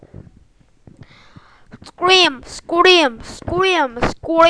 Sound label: scream